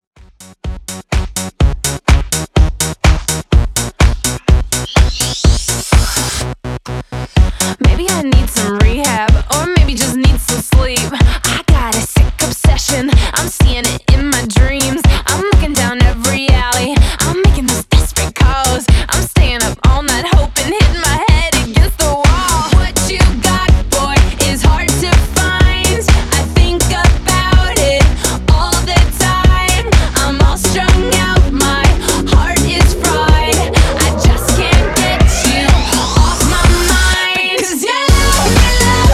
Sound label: Extended Redrum 125Bpm